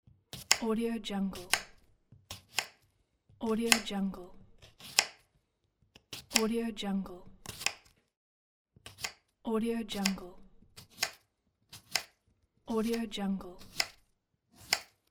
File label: cutting vegetables